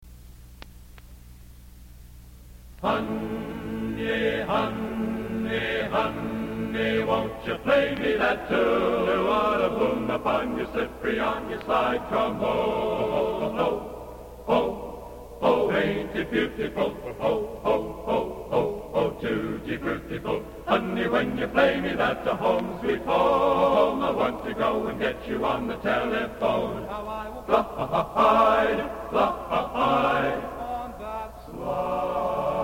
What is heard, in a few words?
Slide Trombone